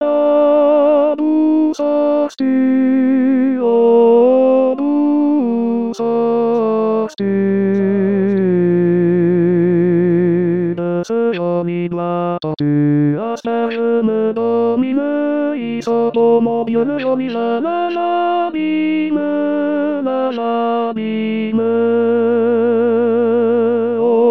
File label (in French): oratoriens tenor; 2019 st jean